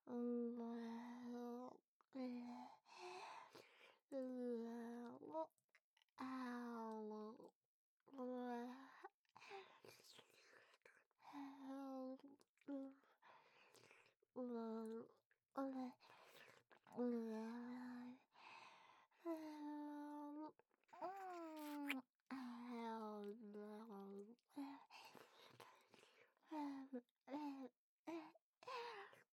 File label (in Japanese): 目の前で亀頭くるくる舐め; フェラチオ切り抜き集